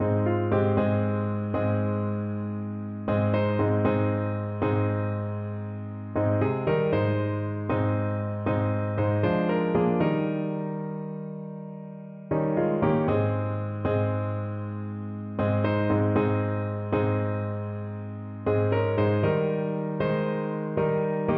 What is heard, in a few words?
rolling downward, piano